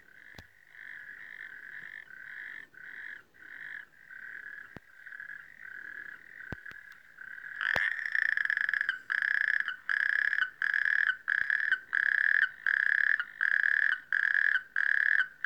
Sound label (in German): Kröte